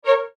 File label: Musical Strings Accent; Multimedia; Musical, Element, Notification, Musical, Hit, Ring, Production Element, Imaging Element, Accent, Transition; Miscellaneous Musical; Blastwave